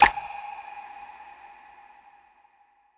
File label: clap g